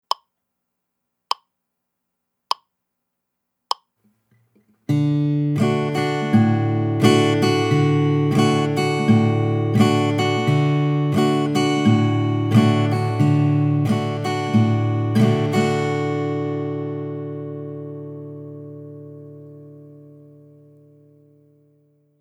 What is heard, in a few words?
The Carter Scratch on D Chord